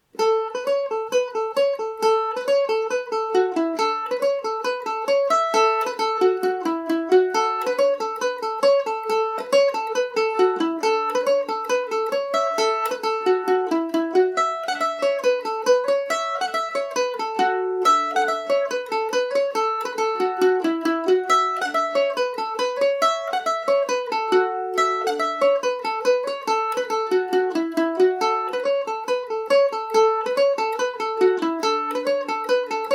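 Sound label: A major, Irish mandolin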